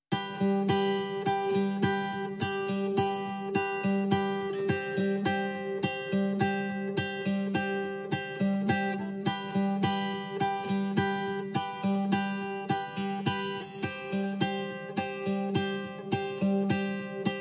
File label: Perc and Guitar